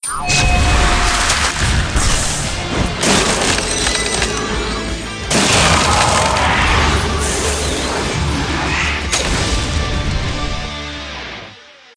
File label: Battle Sino Music; Zak Storm Super Pirate; mobile